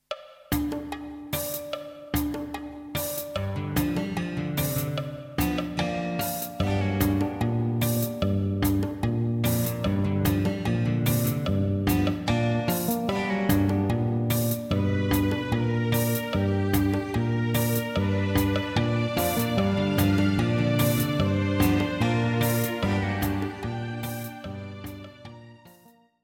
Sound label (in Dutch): fusion, Een eentonige zeereis, statisch.; Kan verlengd worden; AG fusion